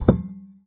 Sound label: pedal, special fx; Xsample VOL-1; 90 s Sample CDs